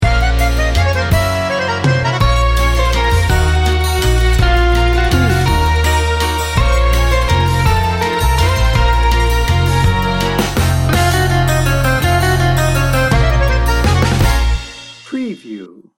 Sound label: wine and romance